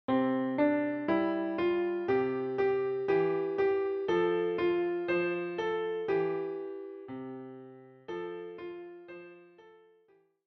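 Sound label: piano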